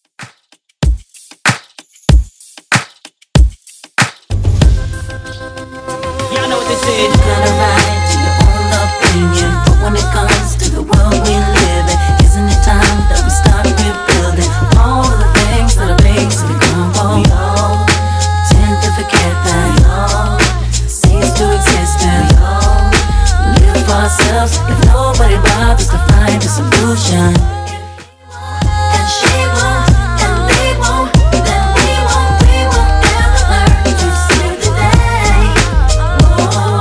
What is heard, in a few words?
Top40 Redrum 95bpm